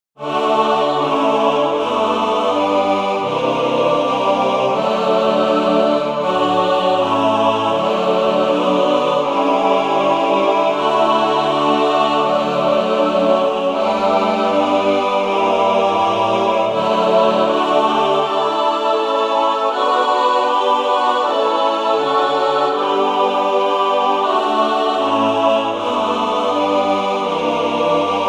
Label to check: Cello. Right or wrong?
Wrong